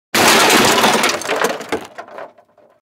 Junk Crash

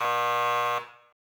Buzzer; Ed Edd n Eddy; Adromulus Pack; EEnE

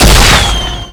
gib flyoff

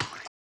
Walking - Wet; Ed Edd n Eddy; Adromulus Pack

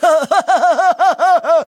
Laugh long; ED; Ed Edd n Eddy; Adromulus Pack; EEnE

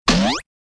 Bonk

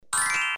Sparkles

